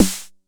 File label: Snare 909 Tune1; gate experiments